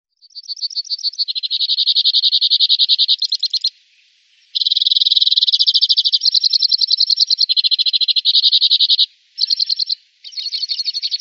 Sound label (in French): chant